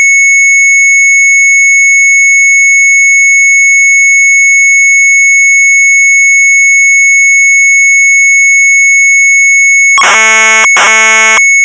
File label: MZ800 Tape, sharp; 800 PATIENCE; retromelsarchive